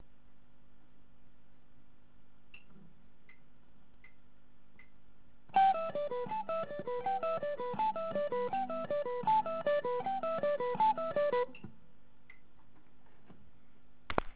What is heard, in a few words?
alternate picking